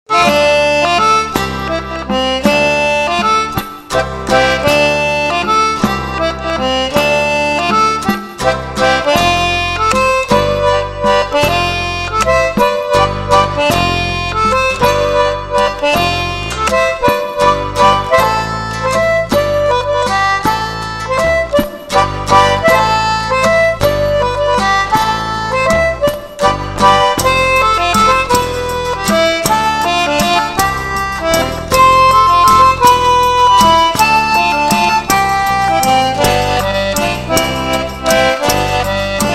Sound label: concertina irish song